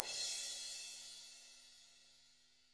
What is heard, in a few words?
BRUSH CYM, Drums; ILIO; Double Platinum Drums 1